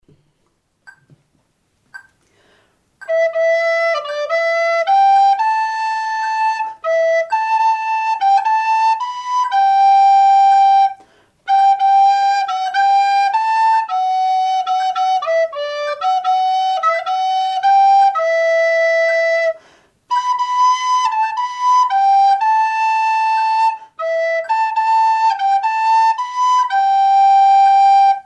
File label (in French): danse israel, FLUTE